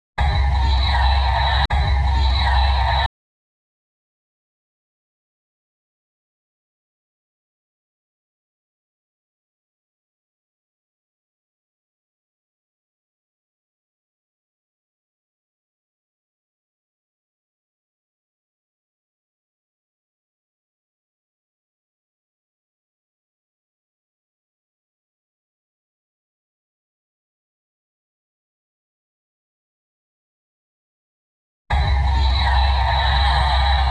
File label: Crickets